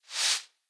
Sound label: Broom Sweep; blue archive